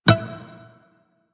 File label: Shawzin Wrong Note B; Warframe